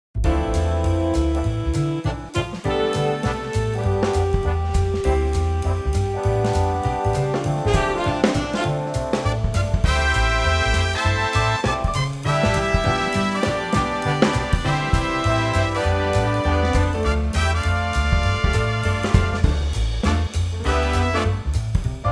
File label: Dm big band